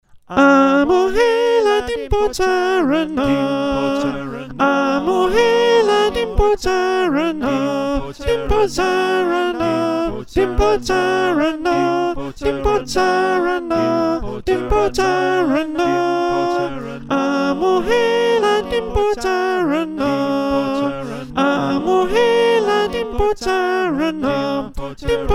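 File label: Soprano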